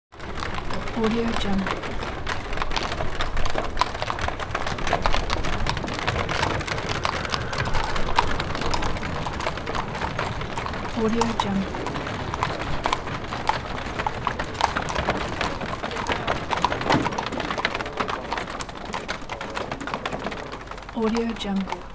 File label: horse drawn carriage pulls up and stops on cobble street